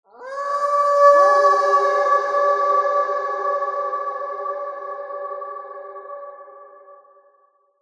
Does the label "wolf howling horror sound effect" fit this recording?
Yes